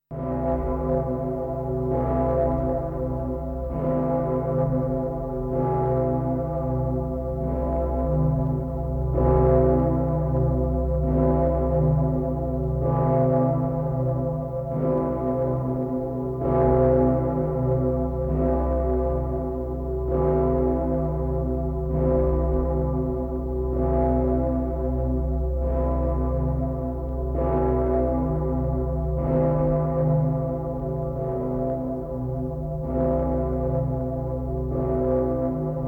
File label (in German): Domglocken; Haushalt